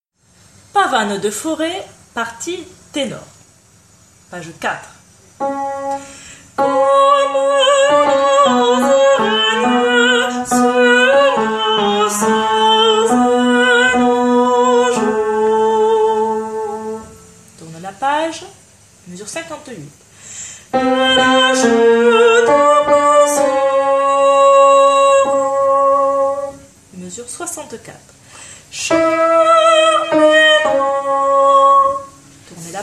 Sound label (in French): Tenor; Pavane; Faure